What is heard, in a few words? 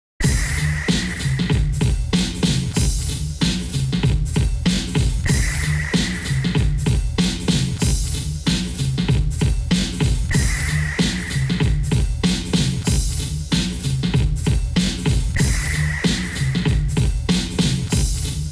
drum